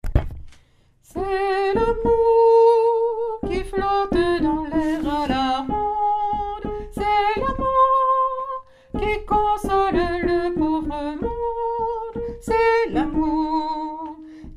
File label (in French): alto mesure, chorale; saltimbanques